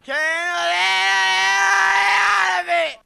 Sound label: scream